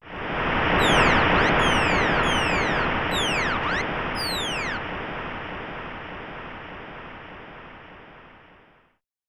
Cheering; SD Great Battle 3; SNES

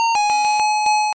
Night Shade

